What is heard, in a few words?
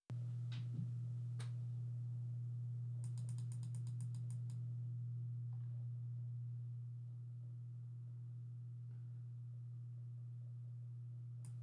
Hum Sound